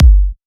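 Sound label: Kick, G minor; Sample Banks; Percussion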